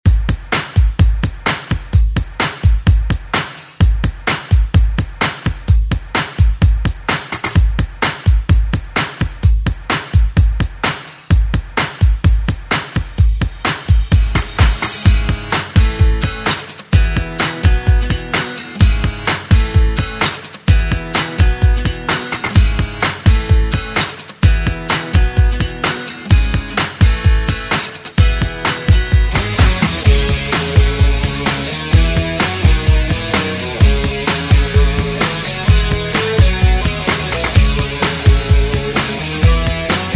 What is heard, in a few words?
70s Ext Redrum